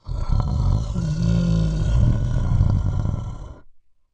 Monster growls and snarls. Creature, Monster Growls, Monster Eerie Sound